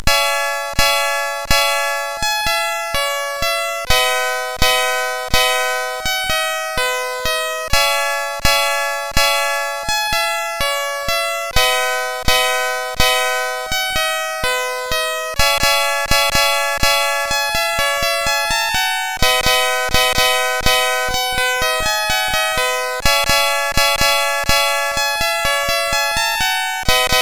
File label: Piano, CSG8580R5; sid; hvsc